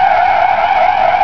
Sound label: FLUX MID; EFFECTS